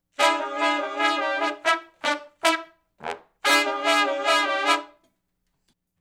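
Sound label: R&B Riff (E) harmony to unison; Sections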